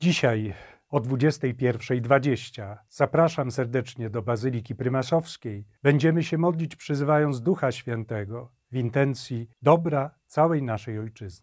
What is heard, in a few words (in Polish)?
modlitwaaa; szosty dzien nowenny w intencji ojczyzny